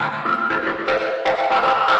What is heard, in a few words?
120 minorsynth filterup; SYNTH AND ELECTRONIC LOOPS